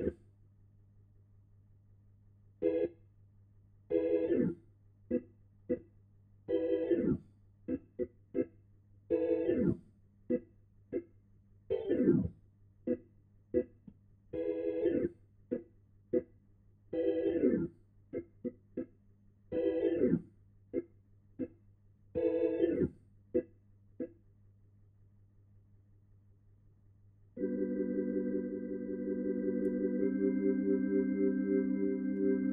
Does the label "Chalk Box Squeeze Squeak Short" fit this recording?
No